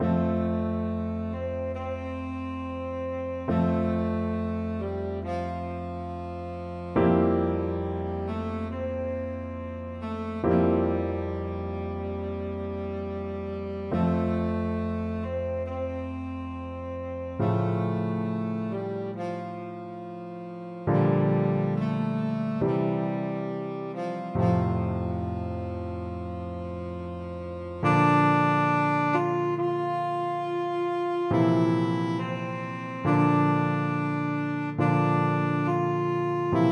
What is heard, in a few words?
tenor saxophone